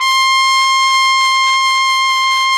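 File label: trumpet; Roland - LA Composer vol. 3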